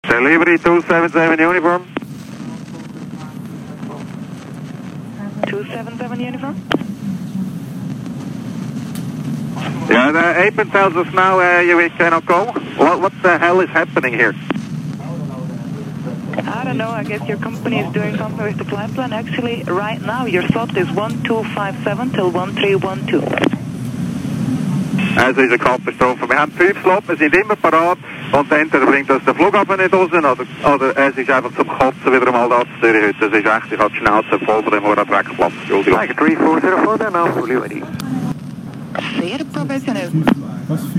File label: Funk